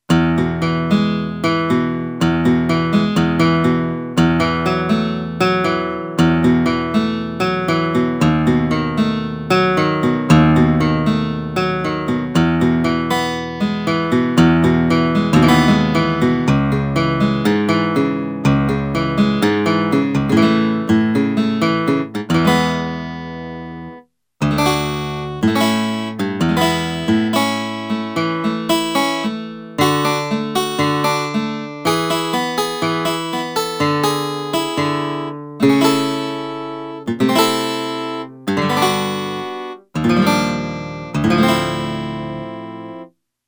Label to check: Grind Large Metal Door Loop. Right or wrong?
Wrong